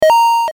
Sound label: dart board sound; autodarts